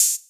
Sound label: Hhat, HiHat; Equipped Music; Loungin House